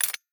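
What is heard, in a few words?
item lockpick up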